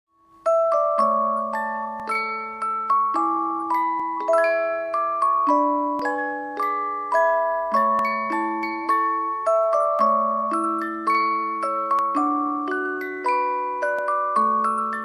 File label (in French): flute enchantee 30 lames japonais